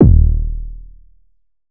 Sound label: 808 one shot Eb; Slate Digital BASSHOLE Sample Pack